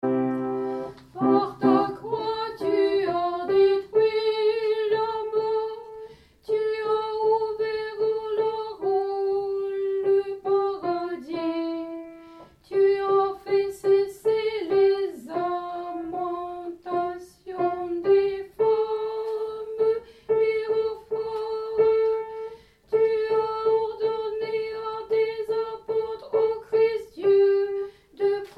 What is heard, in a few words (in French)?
Tropaire Ton7 Soprano; Tropaires du dimanche; Voix soprano